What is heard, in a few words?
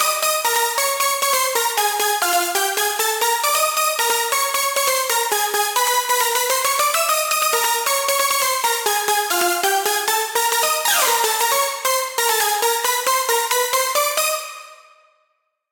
synth